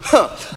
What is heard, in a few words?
"huh", voice